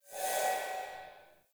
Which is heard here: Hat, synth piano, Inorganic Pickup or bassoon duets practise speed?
Inorganic Pickup